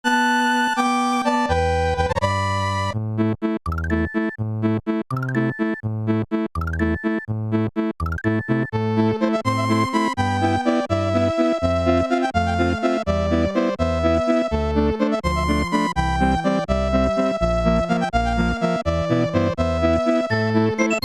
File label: Underwater Theme; Super World; SILVAGUNNER RIPS